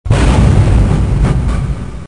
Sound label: mech walk